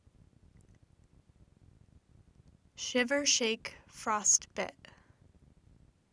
shiver shake frostbit

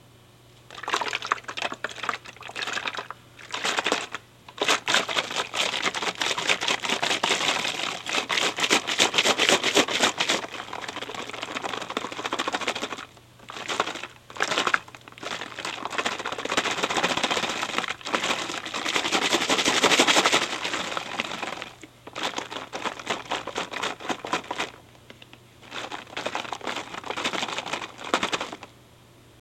bottle liquid

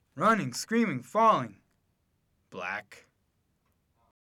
running screaming falling

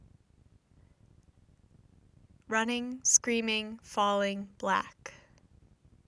running screaming falling

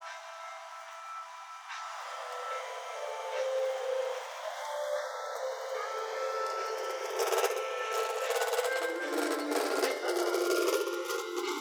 Shuttle

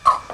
quiet rhythm

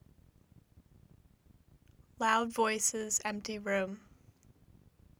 loud voices empty room

